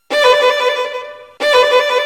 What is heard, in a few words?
Alarm Hii Hii